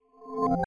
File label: Shutdown; Windows 13-16; Windows Never Released Soundpack 1; deviantart